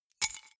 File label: key ring large drop stone soft; objekte; schluessel